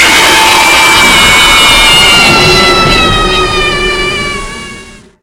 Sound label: jumpscare sound; five nights before freddys